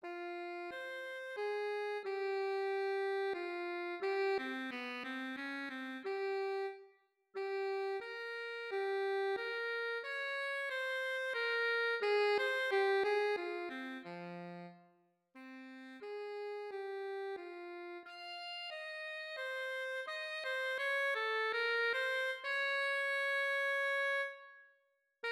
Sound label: alto saxophone